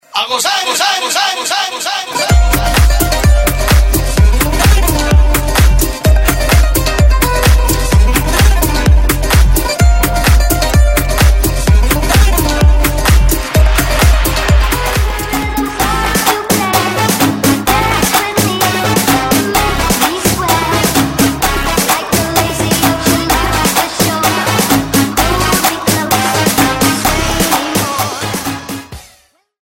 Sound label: latin house ringtone